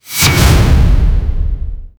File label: magic